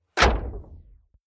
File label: Impact Metal Door Hit Wabble Pitch Down Robot; Sound Design; Stinger Sound Effects